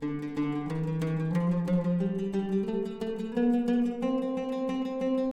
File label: Oud 90 bpm A